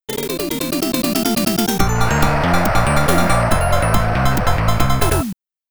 Round Clear; DJ Boy; arcade

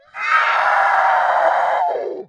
knockout

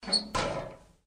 Metal Door Close; granny 3; android

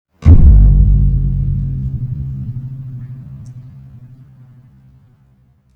Spirits Announcing Goal; This Is the Police 2

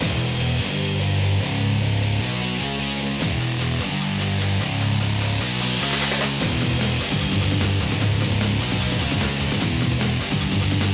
Bgm Battle

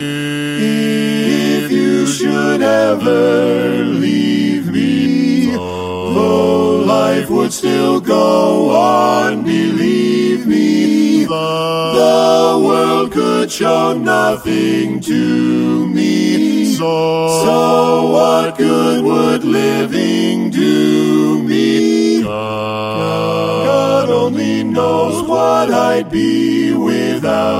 Barbershop Quartet Cover

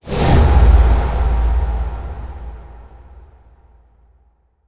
light orb close

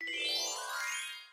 Ui Stars Flying; doodle jump 2; android

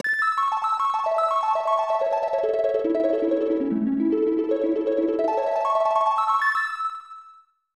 Harp; super aoki ookami to shiroki mejika genchou hishi; genghis khan 2 clan of the grey wolf; snes; Harp of Horde

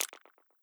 text mouse hold

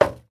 Metal soft

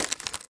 Cutting Wire

